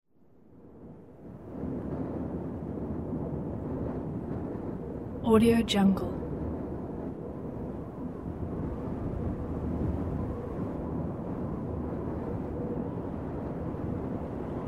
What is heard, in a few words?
wind howling